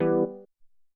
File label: Tek Hit